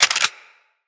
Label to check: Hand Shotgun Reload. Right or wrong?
Right